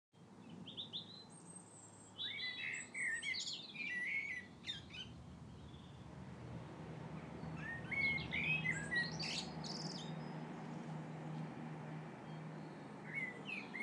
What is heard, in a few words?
evening garden bird song mainly a blackbird